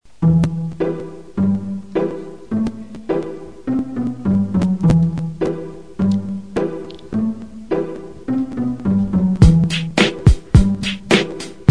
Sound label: Hip hop